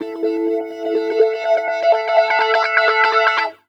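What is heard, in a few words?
guitar, Roots Funk; USB Soundscan vol.41; AKAI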